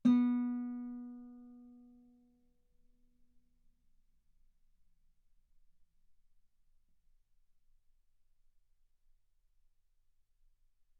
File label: Guitar B3; ff; sul B